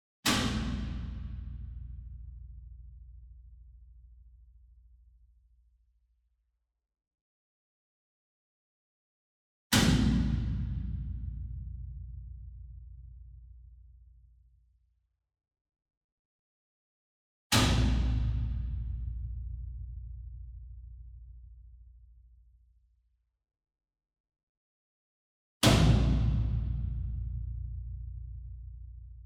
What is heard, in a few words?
Gong Hit Whip Beater Far; SINGLE DRUM; boom library; Cinematic Strikes Construction Kit